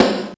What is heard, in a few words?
Rock SD Casio SA-1